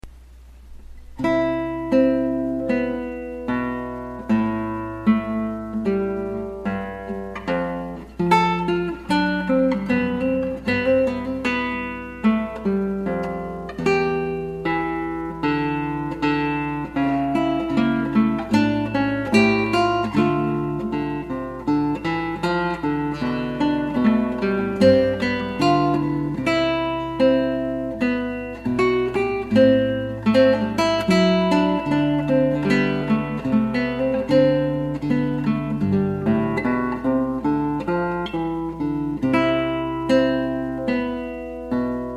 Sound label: classical wedding guitar